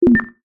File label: science fiction computer console beep button or response